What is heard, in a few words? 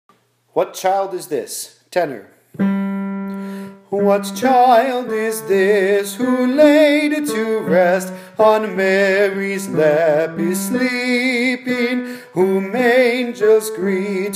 tenor what child is this